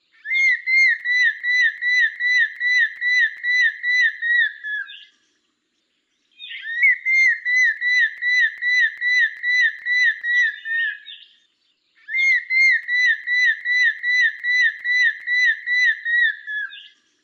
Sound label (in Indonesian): suara pekaka emas